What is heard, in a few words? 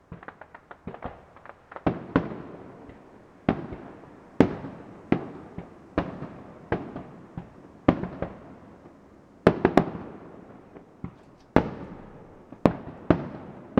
Fireworks